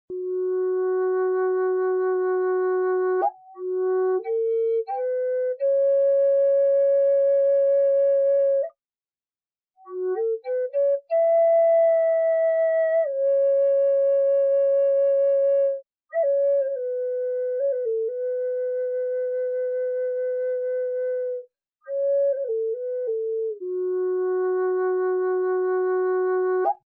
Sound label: low F sharp western cedar; Flutes